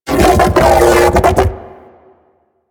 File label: Mech Ambient Vocal; Warframe; DE; Vehicles